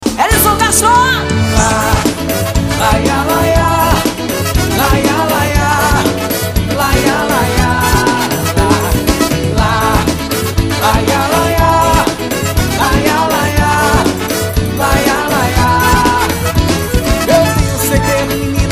forro ao vivo

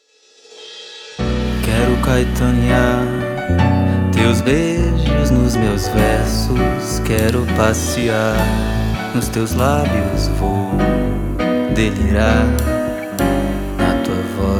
bossa

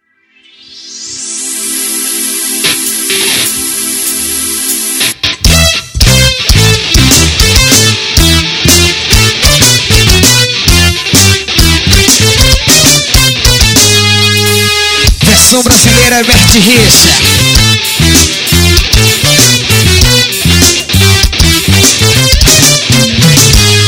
abertura

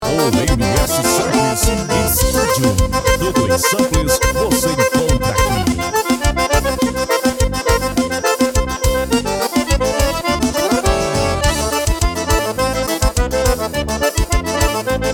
ritmo forro pegado

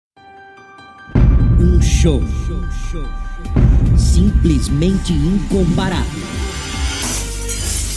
abertura

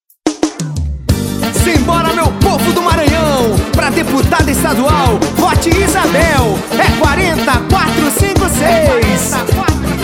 jingle politico

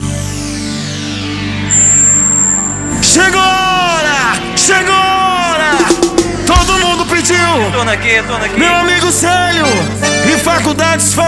swingxote abertura

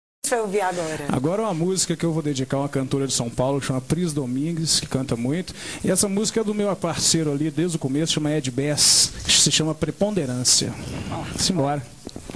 samba de terreiro ao vivo; tv horizonte

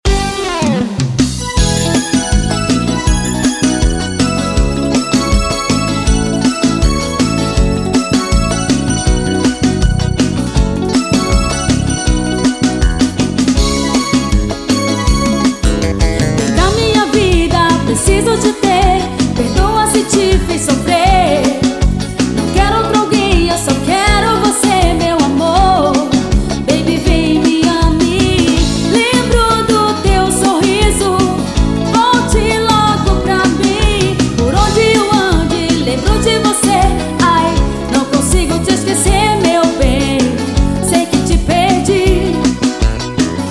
brega pop

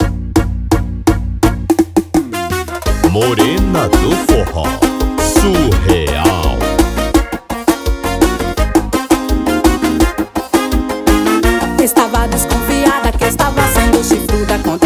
forro

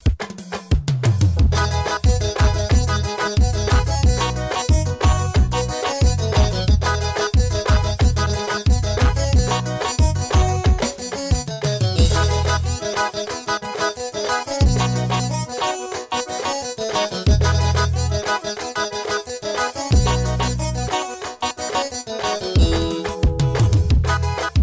ritmos

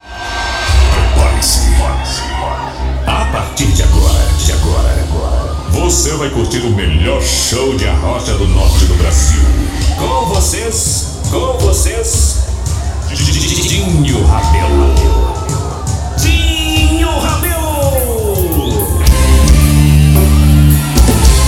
abertura

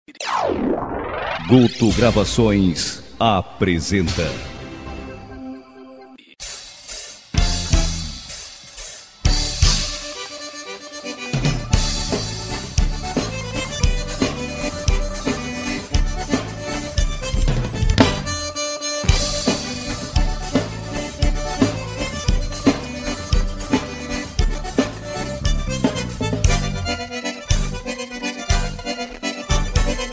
trilha

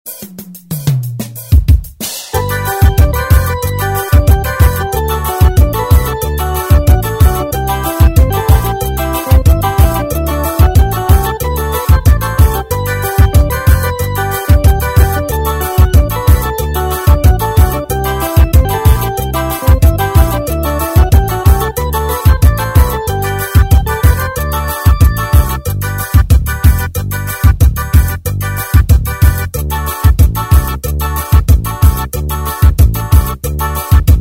ritmos

